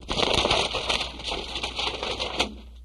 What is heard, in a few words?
Book Flaps Multiple